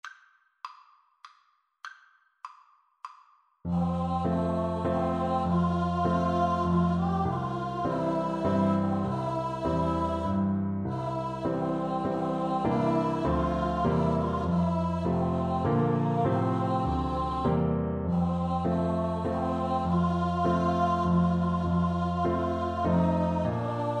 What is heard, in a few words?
sing your way home 2 voices